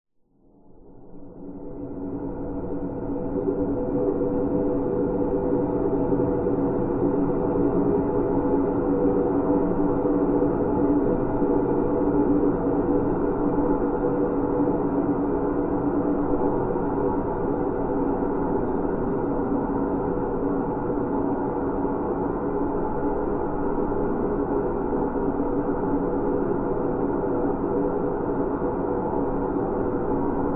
science fiction atmosphere environment dark eerie cold desolate; zapsplat